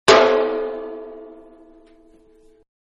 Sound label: Hammer Large On Metal Tank; Tools; Manhattan Production Music